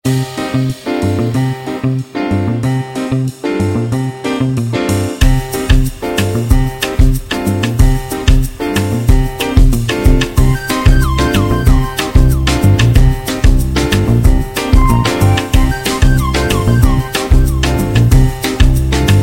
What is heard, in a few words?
elevator music ringtone